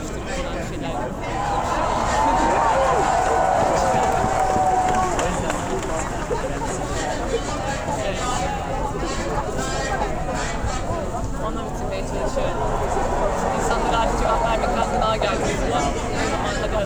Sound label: foley